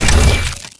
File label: combine locker open; mechanical units